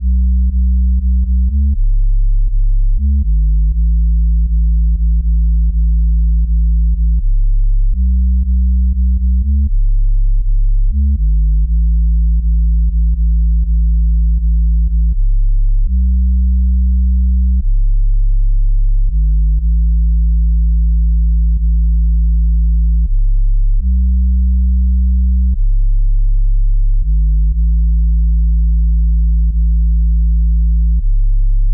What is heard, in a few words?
121 BPM C# Min Sub, Pop; Melodies; Loop Stems; Arena